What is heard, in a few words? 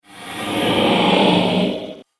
Dragon Monster Of Iron; fantasy sound effect; Monster Sound Effects